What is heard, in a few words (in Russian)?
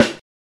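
малый барабан одиночный глухой тихий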